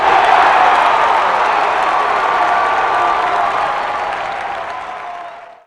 crowd cheer; Totaled; XBOX; crowds

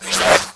Spin, Electric Cosh

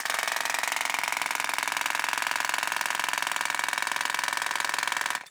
Jackhammer, Ambience; Project Gotham Racing 2

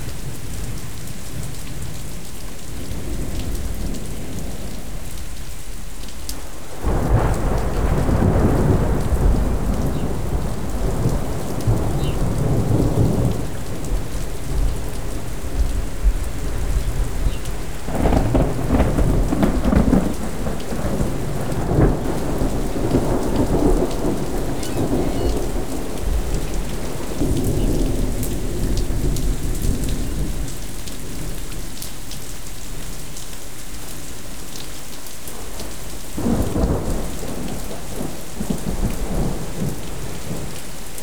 Amb Thunder Rain; Bruce Lee Quest Of The Dragon; XBOX

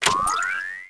confirm Beep

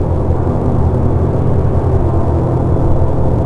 Ambient

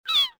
seagull; Soldier Of Fortune II Double Helix; XBOX

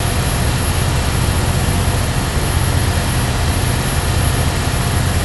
bike idle; Star Wars Knights Of The Old Republic I; XBOX

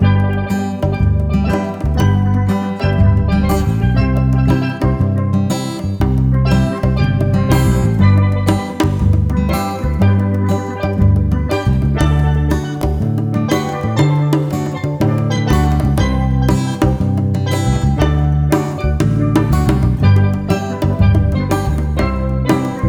payoff music; Kung Fu Chaos; XBOX

